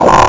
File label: quah